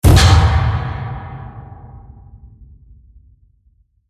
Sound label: Concussion Hit Metal; Ninja Tracks